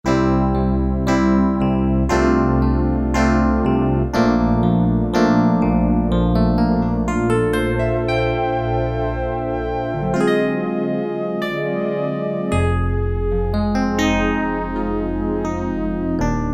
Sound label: PIANO, Casio VZ-10M; PIANO-LOCH